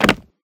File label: wood land; Golden Crayon Sounds